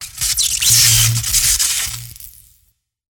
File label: Retreating Spark Burst